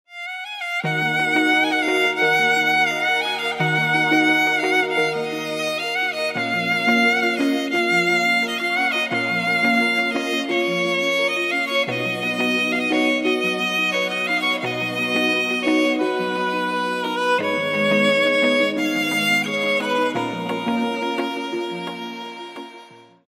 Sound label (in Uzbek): chiroyli nay ringtone gudok muzika